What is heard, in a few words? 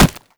body impact; headcrab classic, movement; creatures